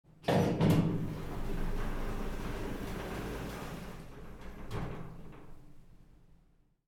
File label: Elevator Door Outside Open